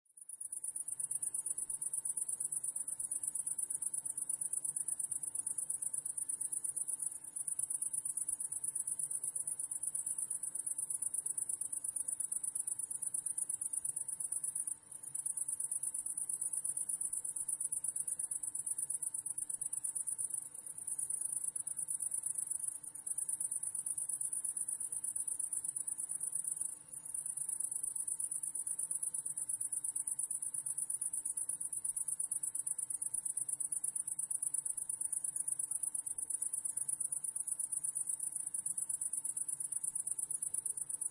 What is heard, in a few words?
Crickets Wide Stereo, Crisp and clear crickets sound.; Wide stereo image, few crickets on both sides of mic, from a warm summer eve; Lynne Music